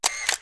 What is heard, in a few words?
camera auto click; Camera Clicking Shutter effect